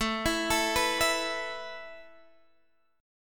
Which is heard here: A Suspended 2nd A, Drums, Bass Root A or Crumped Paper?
A Suspended 2nd A